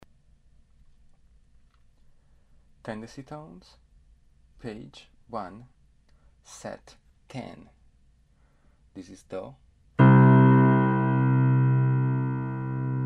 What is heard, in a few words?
tendency tones